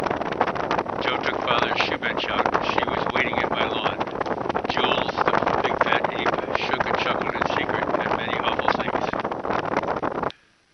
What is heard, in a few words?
hikers story no protection 15mph wind; mckaudio